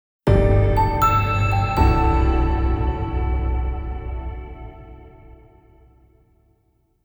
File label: Rank Up Jingle; Oninaki